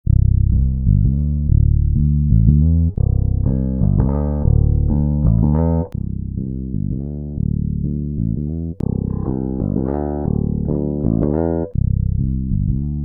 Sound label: Spector